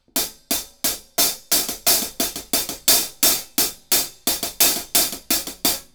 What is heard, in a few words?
Hihat Drums Bus Driver; Kelvin